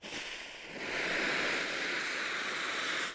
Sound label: Objective Bomb burning; gamerip; Out of Reach: Treasure Royale